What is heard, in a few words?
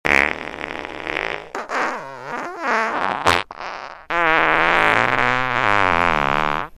Fart